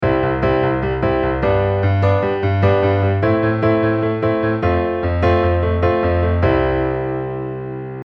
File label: 8 beat